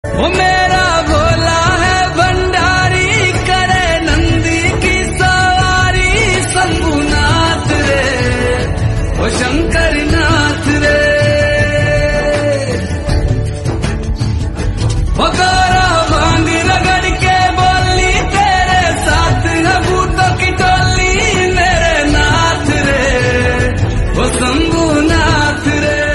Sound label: Hindi Song